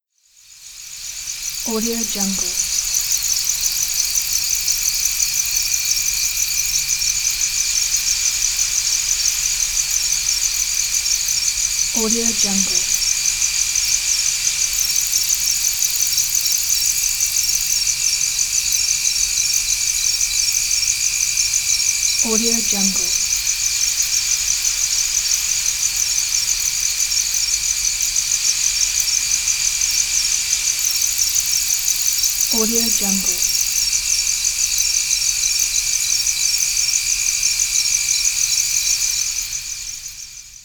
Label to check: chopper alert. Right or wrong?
Wrong